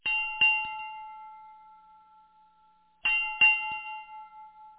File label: chat notification